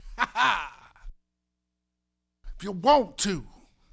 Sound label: Vocal, 70s Analog Funk; Sonic Foundry (Sony Creative Software); What it Is! 70s Analog Funk